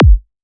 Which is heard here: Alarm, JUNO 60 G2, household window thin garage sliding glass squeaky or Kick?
Kick